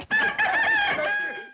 rooster; graveyard

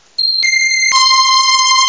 strings; Amiga-CD 1996 #5-6; sampler; Instruments; Amiga Magazin

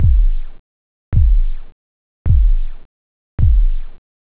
blamblam, drums techno; OctaMED SoundStudio V1

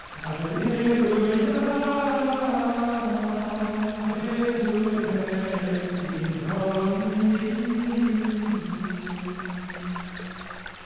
chant; Imitatio Christi; If Monks had Macs

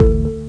Organ With Kick; Instruments

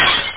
clay; shootgal

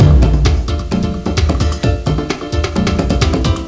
4 bar; Singletrak Mind; EQUIP

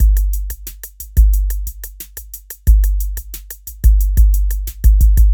beat

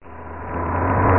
Warp; Oids 1.3

